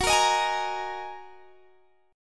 F sharp 6th Add 9th, down, Guitar, Standard; strummed; JGuitar

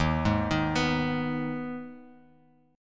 D sharp Suspended 4th Sharp 5th, Bass Standard; arpeggiated; JGuitar

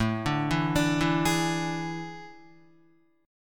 A Major 7th Suspended 4th, Guitar; arpeggiated

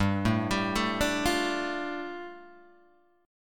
G 7th Suspended 2nd, Guitar Open G

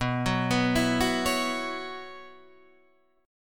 B Suspended 2nd Suspended 4th, Guitar, DADGAD

